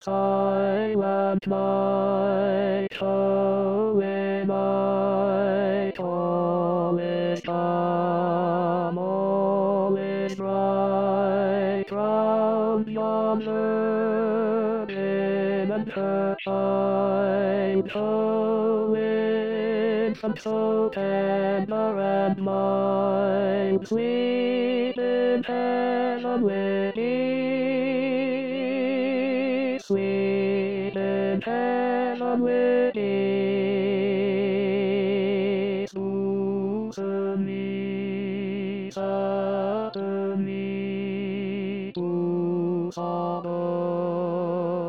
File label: Tenors